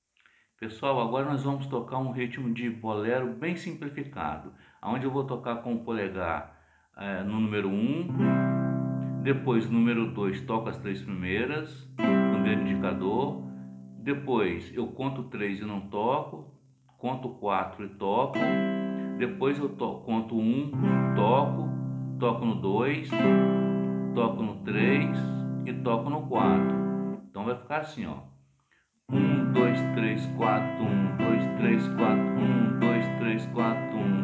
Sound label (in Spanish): Ritmo tango; ritmo tango 6